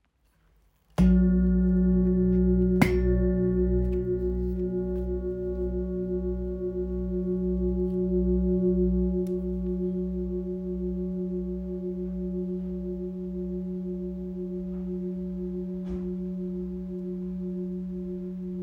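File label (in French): Gros bol fa dièse 9.72kg, Bol chantant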